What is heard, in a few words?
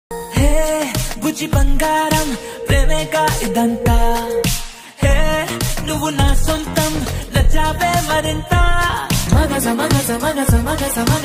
telugu ringtone